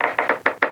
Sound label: perc, Drums, One Shots; trifecta; Slate; AURORA Sample Pack